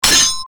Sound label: sword